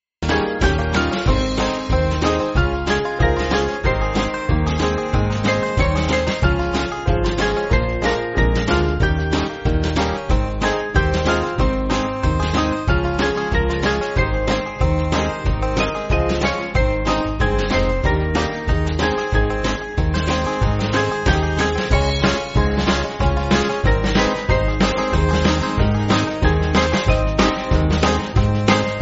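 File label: Band, Backing Files for Churches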